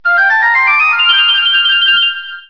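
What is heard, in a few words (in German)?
Flöte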